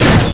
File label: Mine Cart Flip; A mine cart flipping over